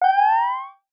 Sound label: notification drop